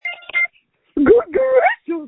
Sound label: Scream